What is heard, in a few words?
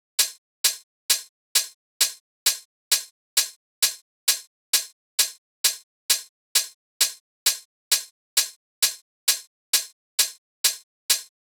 Drum Loop - 132 BPM Hihat, Garage; Loop Stems; Cymatics; GHOST (Beta Pack); Centre Drum Loop